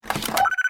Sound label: Battery pickup; SW JA